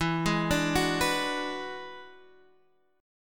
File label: E 9th E down Guitar Open D